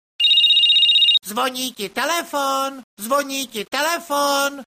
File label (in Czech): zvoni